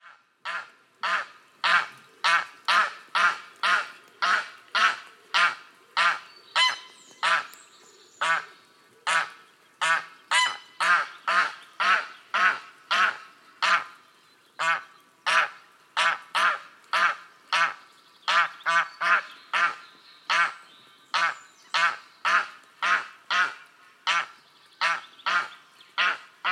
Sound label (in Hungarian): nyári lúd Anser anser